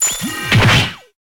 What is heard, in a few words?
Hammer; Inotia 3